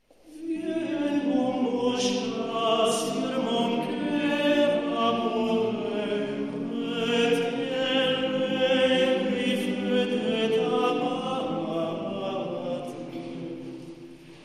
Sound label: male Choir